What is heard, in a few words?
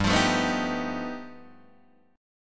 G Augmented 9th, Guitar, Open D